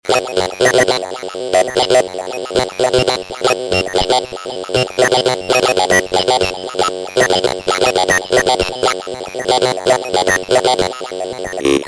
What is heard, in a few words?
glitch ring, cell phone; razr; cell-phone-hacking